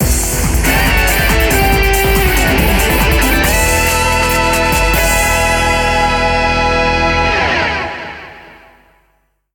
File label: Jingle - Win